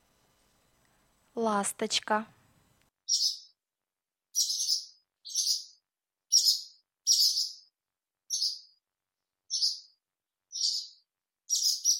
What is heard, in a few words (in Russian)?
Ласточка